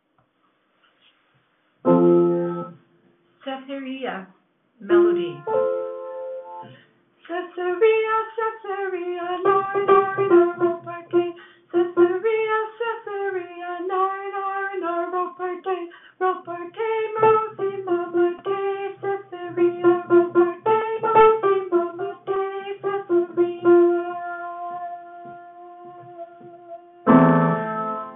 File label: Melody